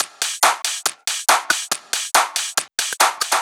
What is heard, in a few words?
drum Loop 140BPM Full